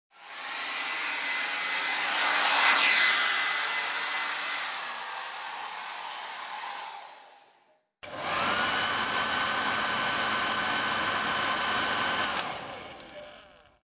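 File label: hair dryer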